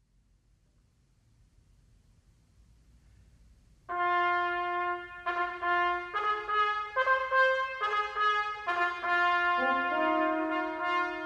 Fanfare

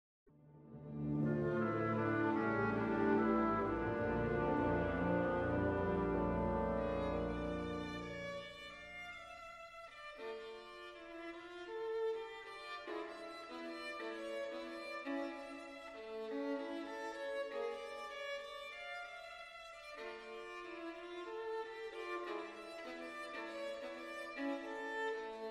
vln cadenza

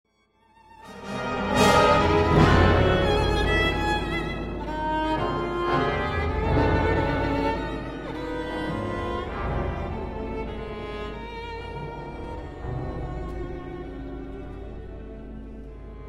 violin; berg

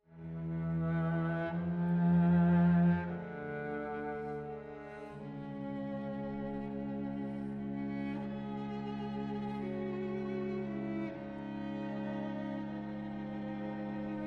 Str Qt; Shosti; tortured quote

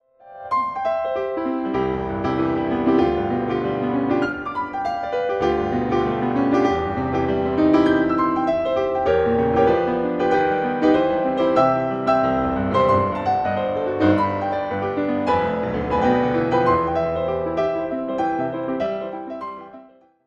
boogie woogie

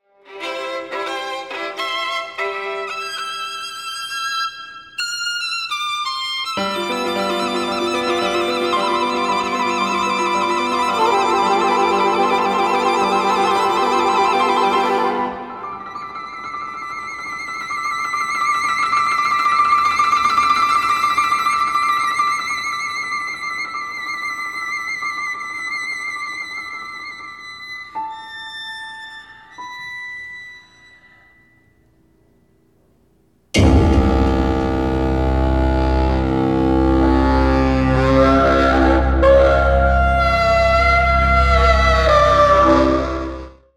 trill; tuur

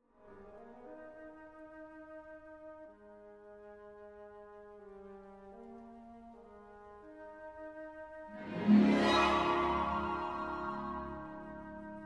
trill Tres lent; ravel

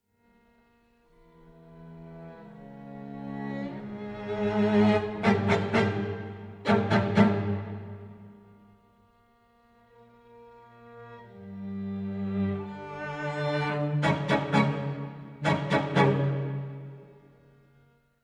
Str Qt; Shosti; young guard quote

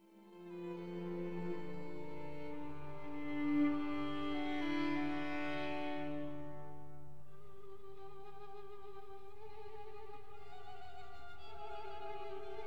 trill; schnittke

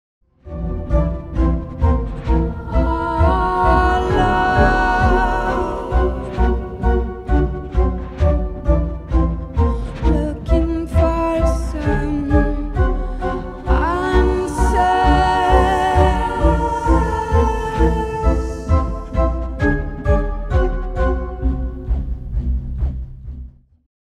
organ

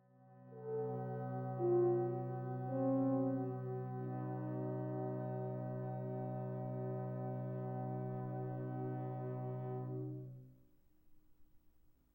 neutral third

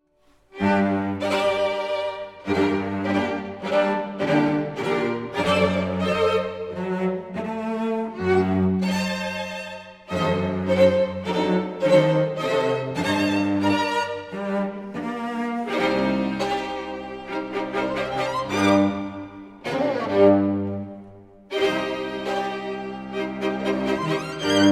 Schubert quartet

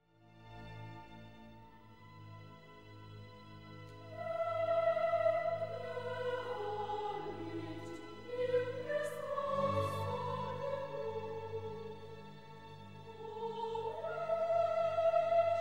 chant section; Dvorak

